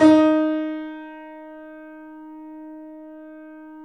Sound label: The Piano D#; InVision Interactive - The Piano